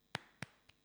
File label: one clap